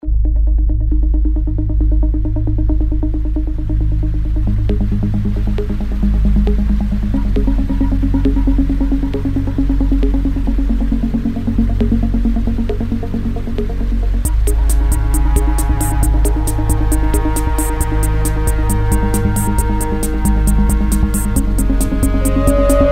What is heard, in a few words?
liquid dub break